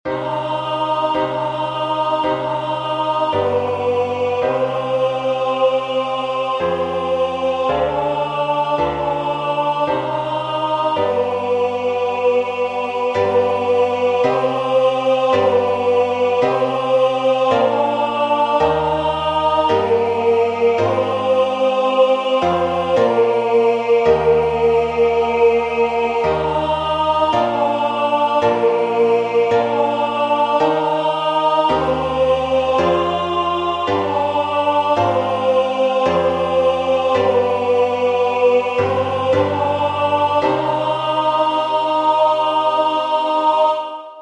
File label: TENOR; When monarchs unite